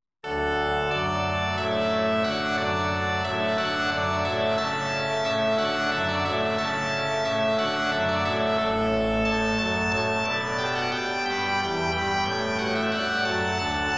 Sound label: Hornpipe; from Water Music; Handel